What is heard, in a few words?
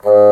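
Bassoon p G#1; Digital Sound Factory Virtuoso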